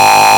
MS RING, Vintage; INIS

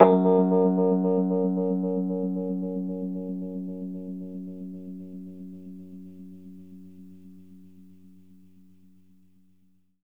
Rhodes fast F#, 3D Keys; 3D Sound; 3D Keys VOL-1

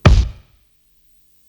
Kick, Psychedelic Drums

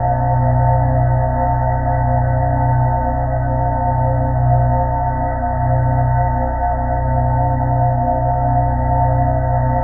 Lunar Drone; Sonic Foundry (Sony Creative Software); Numina II

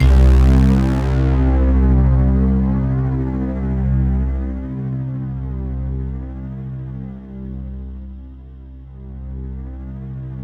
C1, CLUB ORGAN, Roland JV 2080

